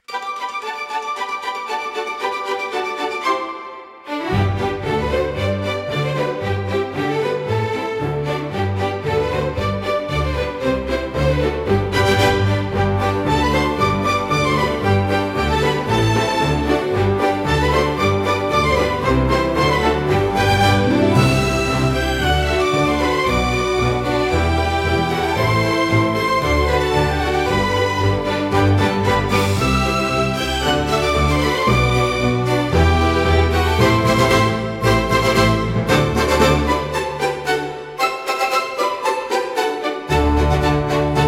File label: fantasy music cute bright; disney style; fun fantasy world